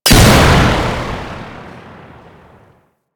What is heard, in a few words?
Crp Bow Second Explosion; Warframe; Weapons; Corpus